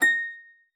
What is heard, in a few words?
A6, HARP PIANO; Xsample VOL-8